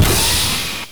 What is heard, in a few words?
mecha